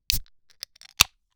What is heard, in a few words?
Soda Test SFX